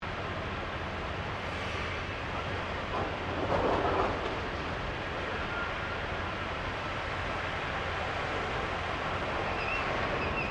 Industrial Factory Operation Distant Loop; Various industrial machines; Machinery Sound Effects; Machinery (Sound Effects:Machines)

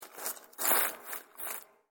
Warehouse Searching Through Garbage; Garbage Sound Effects; Foley Sound Effect

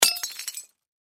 Crash Glass Cologne Bottle Break Drop Crash; Blastwave

